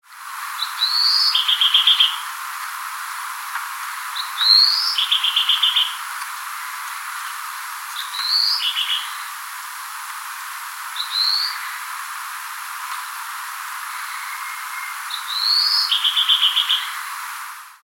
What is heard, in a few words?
buzz chitter; Sometimes given with introductory buzz notes rising in pitch; mostly given in breeding season